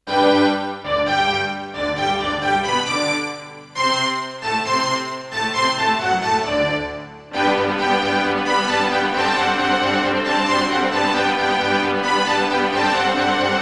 Gravis UltraSound 1MB Rev.3.4; classical; Mozart; Eine Kleine Nachtmusik partial

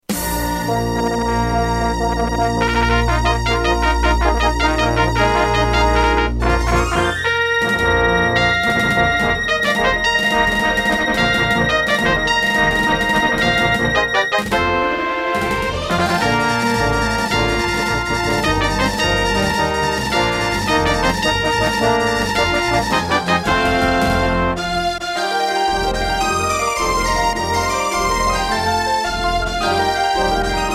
Intro, Terratec MiniWaveSystem TTWT; Dark Forces

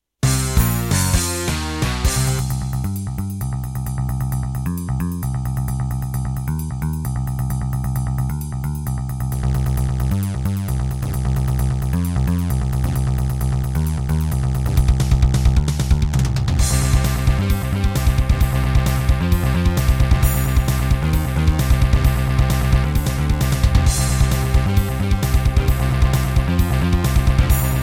Serdaco Dreamblaster X2GS NATIVE; sigil doom episode 5

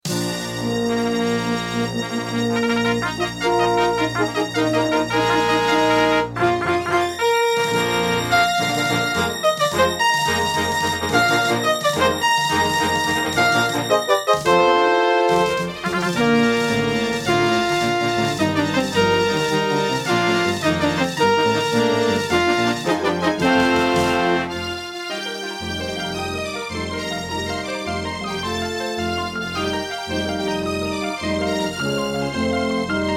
Intro, Pine PT-2030 Vivaldi Xtra Wavetable DB; Dark Forces

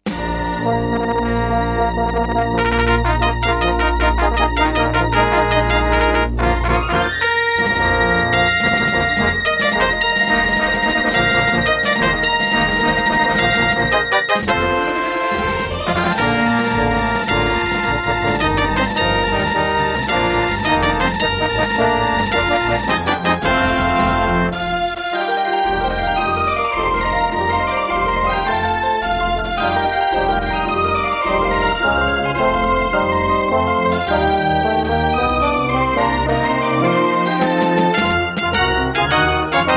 Intro, Aztech CS9233; Aztech CS9233 DB; Dark Forces

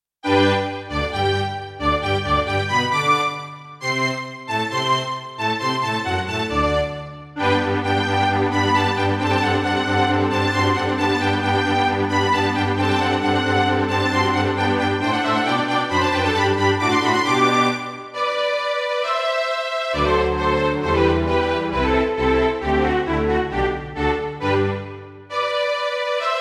Roland SC-88ST Pro; Mozart - Eine Kleine Nachtmusik partial; classical

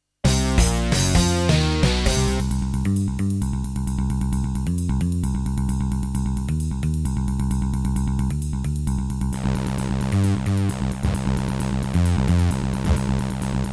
NEC XR385 (DB60XG Clone) GS MODE; sigil doom episode 5

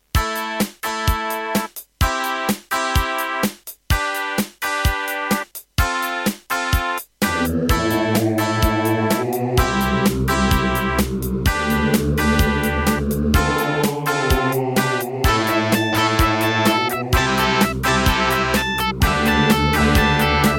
Gallant GMW-1000; raptor call of the shadows

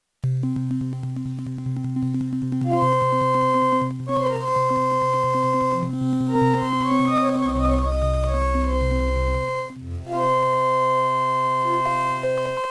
Beepblaster 2.0 SYNTHMODE; Prince of Persia 2 - Intro

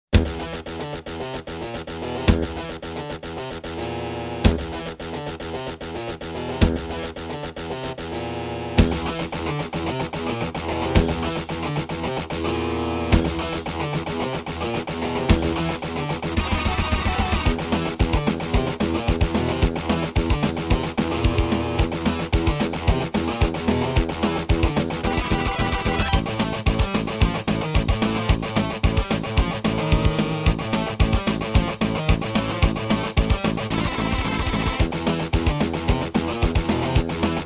Korg 05RW; Doom

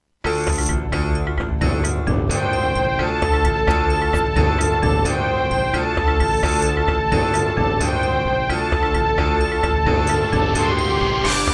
Serdaco Qwave, wavetable; Heretic

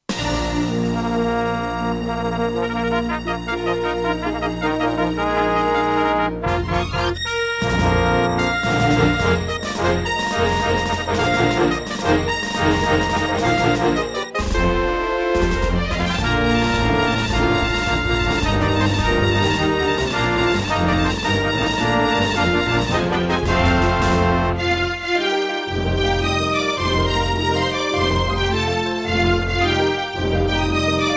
Intro, Roland SonicCell GM2 TEMPLATE; Dark Forces

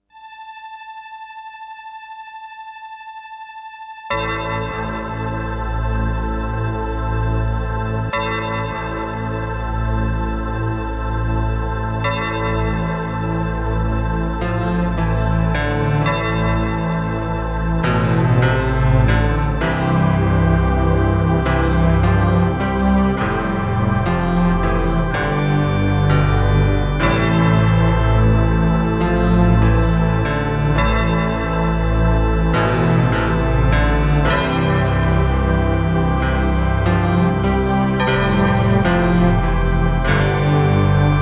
Terratec WaveSystem SWC001-2; Gabriel Knight 1; Introduction

